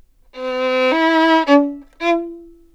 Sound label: violin